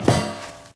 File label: metal grate, footsteps